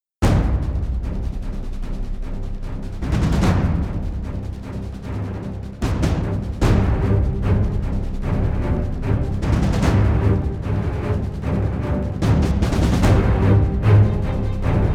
Deep Perc Beds Epic and emotional percussion beds and action loops; Cinesamples